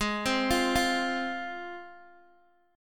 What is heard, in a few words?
Ab 6th Ab, Guitar, DADGAD